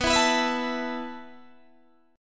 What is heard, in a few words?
B 7th Suspended 4th B down Guitar Open D